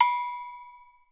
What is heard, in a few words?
AGOGO LO, PERCUSSION; Crypton - DrumBase5000